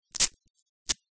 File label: velcro tape; Barotrauma; Neurotrauma